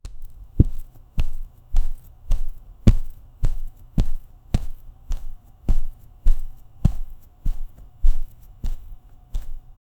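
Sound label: slow crabs footsteps g, mid frequency; mr crabs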